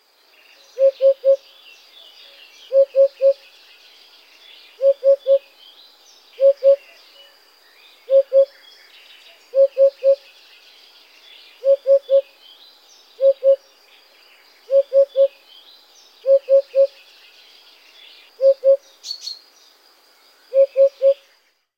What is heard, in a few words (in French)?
Huppe fasciée chant